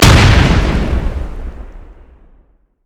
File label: q attack; LoL; jinx base